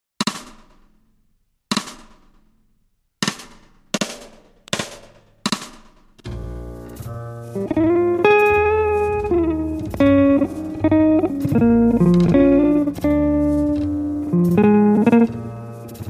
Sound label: Blues Bb